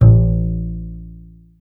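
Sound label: string contrabass A; Miroslav Vitous; Solo Instruments VOL-2; Solo 2 Bank 1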